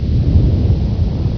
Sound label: quake; GZDoom